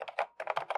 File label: Friend-Typing